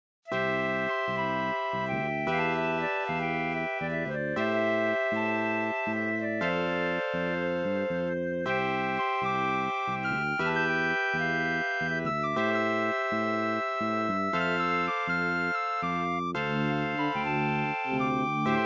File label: melody flute with bass